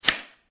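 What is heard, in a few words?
card discard, mahjong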